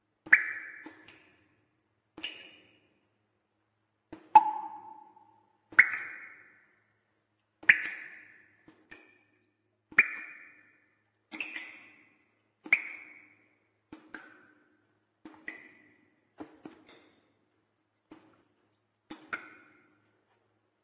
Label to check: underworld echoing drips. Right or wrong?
Right